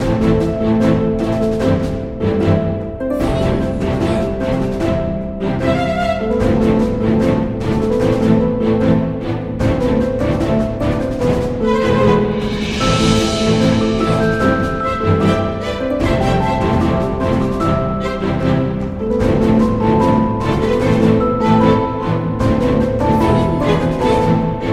森林战斗

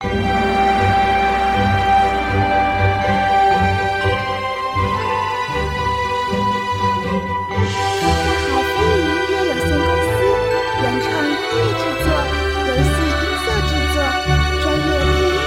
宏大-管弦-主城-日系

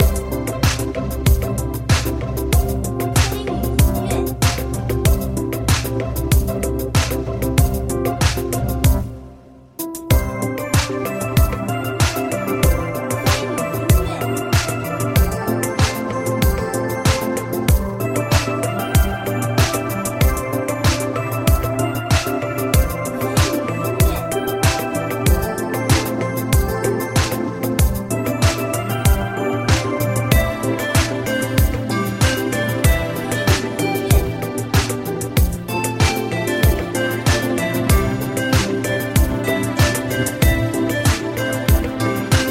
关卡场景

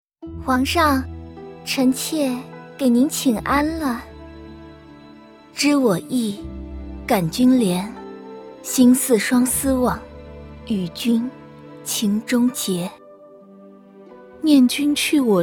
女 BGM

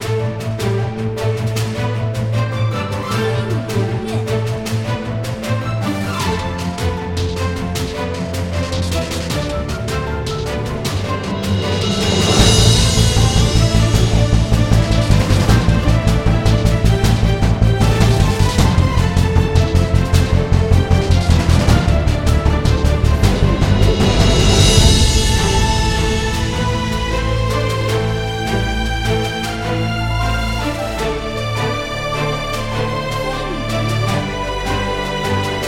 常规战斗

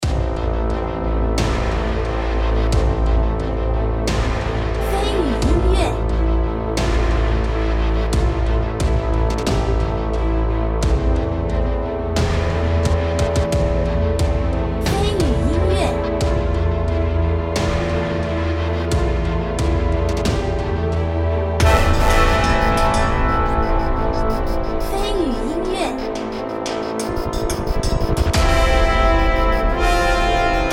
公会boss

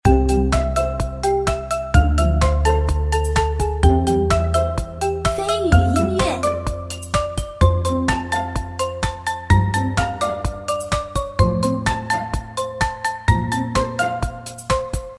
节奏感-欢快-俏皮

